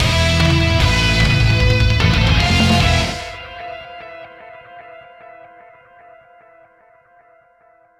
Jingle Victory; Pawarumi

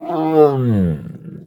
whimper

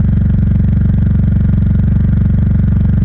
Car Idle Loop

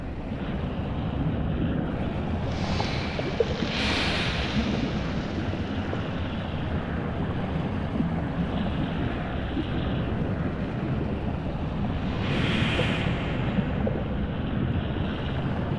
Ambient Lava Stereo; McDroid

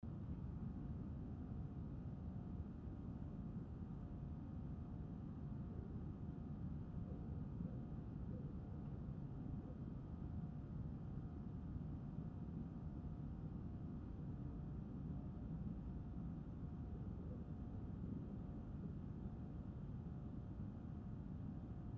House Ambience; Class of 09 The Re-Up

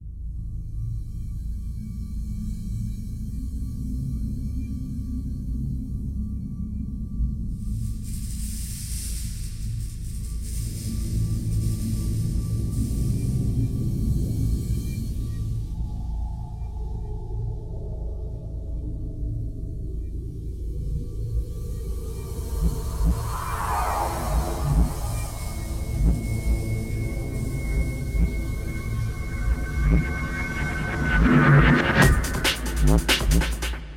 music - cinematic, loop; You Are Empty

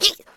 throw; Scrat

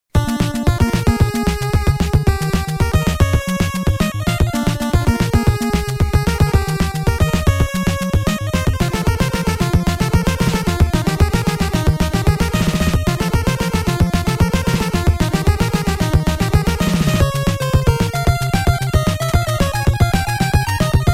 Power-Up BGM; Konami Shooting Collection

